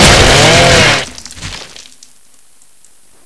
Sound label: chainsaw hit bod, weapons